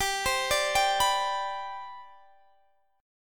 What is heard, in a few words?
C Major 7th Suspended 2nd G, chord